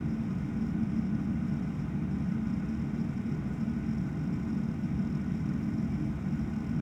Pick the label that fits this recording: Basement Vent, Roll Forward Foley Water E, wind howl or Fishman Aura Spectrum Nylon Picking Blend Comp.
Basement Vent